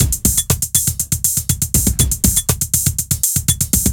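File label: drum loops, right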